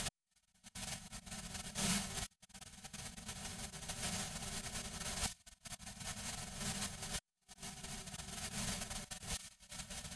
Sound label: piano NMF